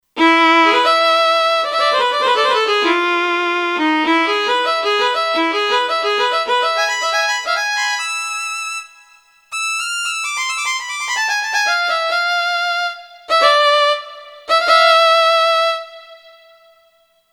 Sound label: Fast Violin, Kurzweil K2500X; Factory Programs